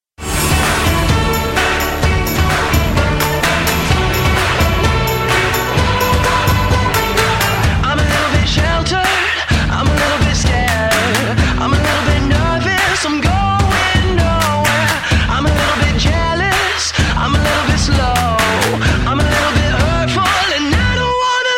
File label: kick tryout faster